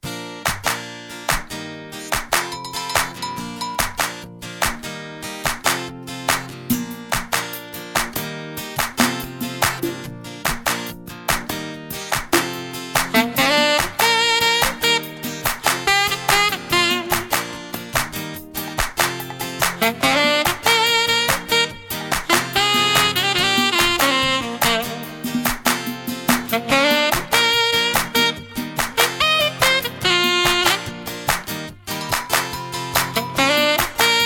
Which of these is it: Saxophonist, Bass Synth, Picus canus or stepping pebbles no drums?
Saxophonist